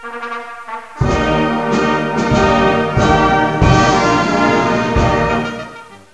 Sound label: new hiscore; Galaxis